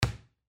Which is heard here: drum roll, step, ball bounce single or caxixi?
ball bounce single